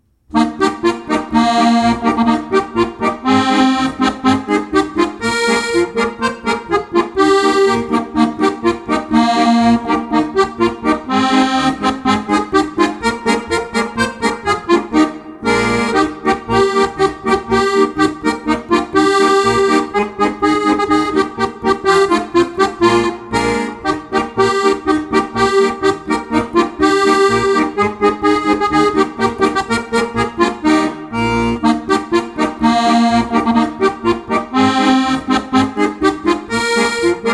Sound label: Jingle Bells, akkordeon; weihnachtslieder